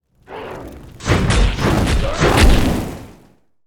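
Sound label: dark spirit attack special, monster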